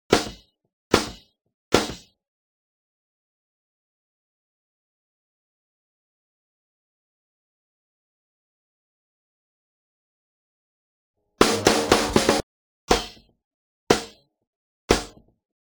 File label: Snare